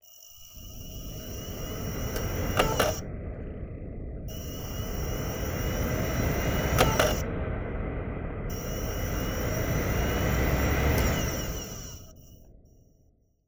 Spin Up, DVD Drive Internal; DVD Drives

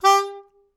Melodica Short; Short Notes Chromatic

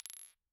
Bottle Struck

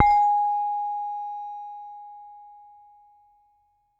Saron B, Gamelan

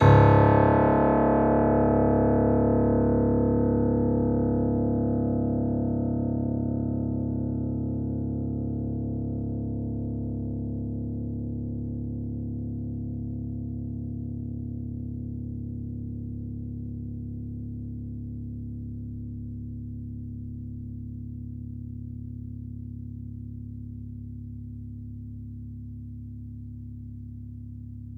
String Pluck, Piano; Piano Miscellaneous

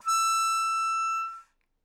Melodica Long, Long Notes Chromatic

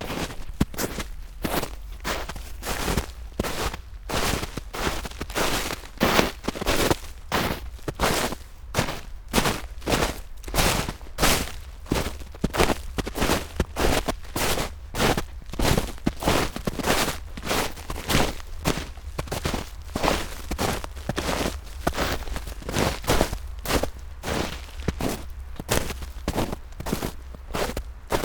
Snow Footsteps

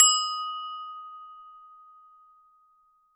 Hole Saw; Hole Saw Bits

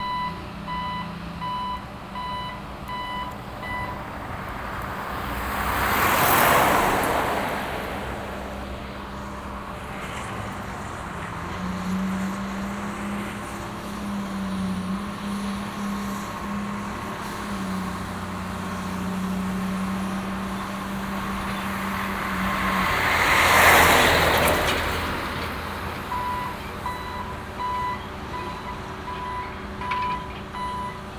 Dock Traffic Belfast; Sound Scapes

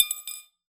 Drop On Base, Glass Medium Wine Glass

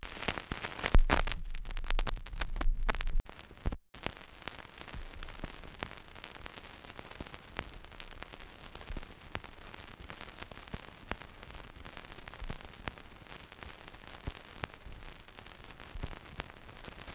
Vinyl

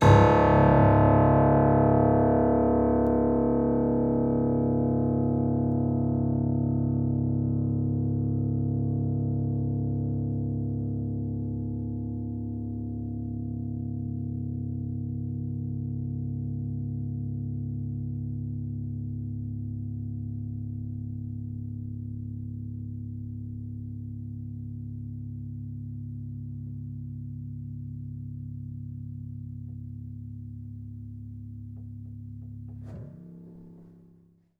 String Pluck Ped, piano; Piano Miscellaneous

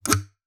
Classical Guitar Extended Technique

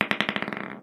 Snooker Ball Bounce; Balls

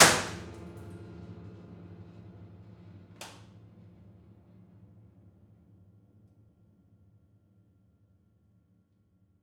Key Smash, Piano Destruction

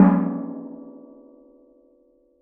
Strike, Washing Machine Drum Outside

